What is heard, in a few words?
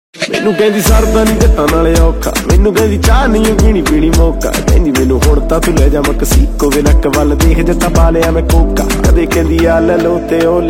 ringtone punjabi